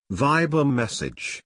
message; Viber

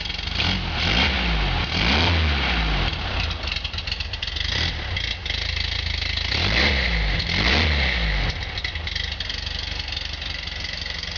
Beetle Stock Motor

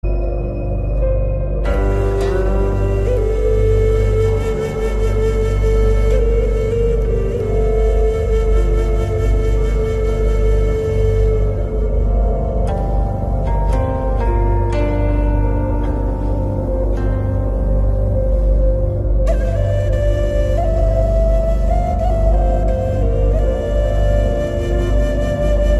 flute guqin

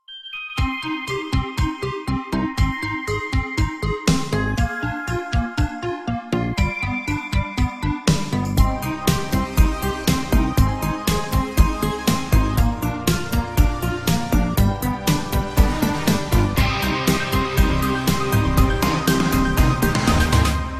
Retro Intro

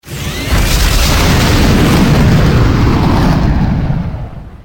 Rocket Launch

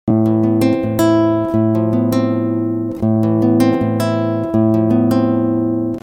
Acoustic Guitar

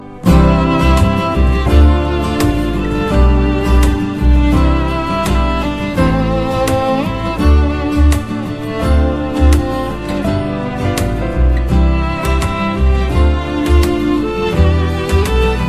Symphony

